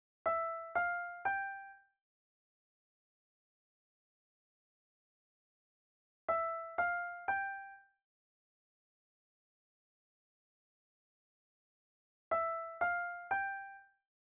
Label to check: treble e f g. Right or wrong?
Right